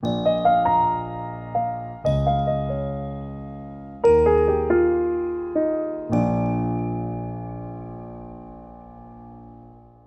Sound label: ubuntu touch melody piano